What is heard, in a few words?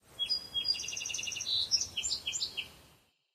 Amb Birdvillage Birds